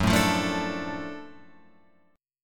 F sharp Minor Major 7th Sharp 5th F sharp down Guitar Open F; strummed